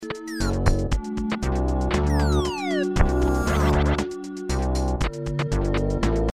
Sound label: music amphibian; e.v.o. search for eden